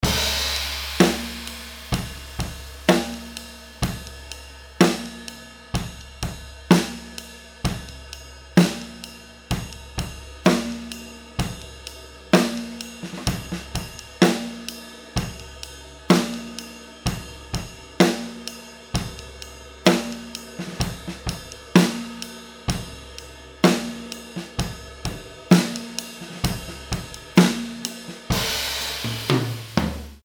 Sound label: lct 640 ts overhead supercardioid; lewitt